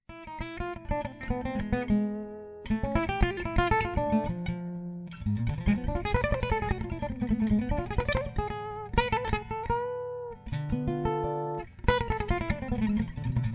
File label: lead; Gitane